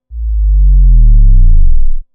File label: Clean Bass Bend